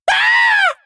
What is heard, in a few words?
scream; Prepotente